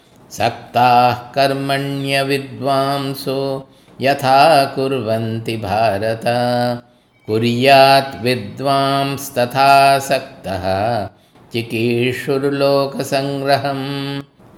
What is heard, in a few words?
Chanting